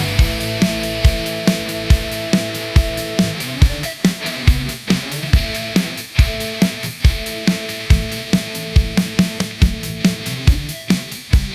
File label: hairmetal 140bpm